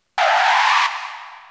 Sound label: car stop